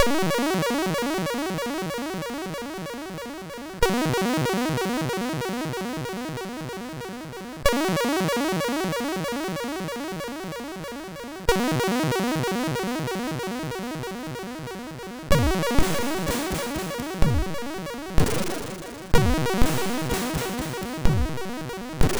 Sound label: sid MOS6581; hvsc